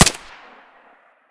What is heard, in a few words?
gyrza silenced far; Escape from Tarkov